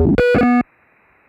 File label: Raw Glitch